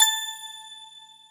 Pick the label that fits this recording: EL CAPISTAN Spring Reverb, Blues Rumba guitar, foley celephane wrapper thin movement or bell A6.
bell A6